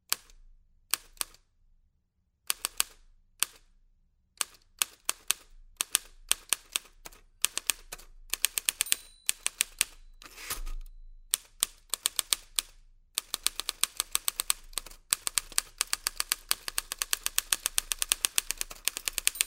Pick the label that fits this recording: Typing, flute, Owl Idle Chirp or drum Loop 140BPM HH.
Typing